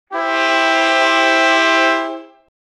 winning simple celebratio, happy

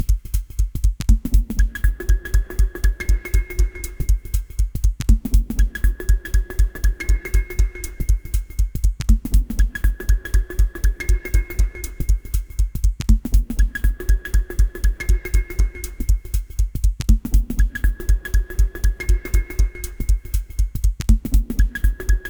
a rhytmic hypnotic loop, drum

